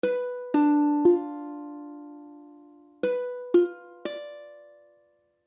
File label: Hdim, akordy ukulele; H akordy (=B akordy)